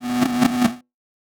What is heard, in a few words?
Sci-Fi Electronic Pulses; Science Fiction